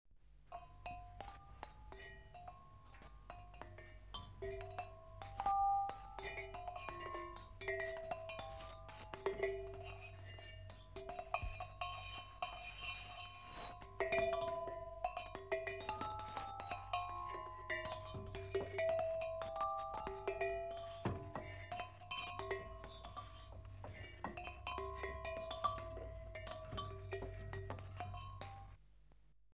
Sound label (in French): cloche frottee